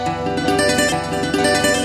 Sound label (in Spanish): guitar